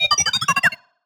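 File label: Sci-Fi Droid Robot Emote Beeps; JASEM: Just Another Shooter with Electronic Music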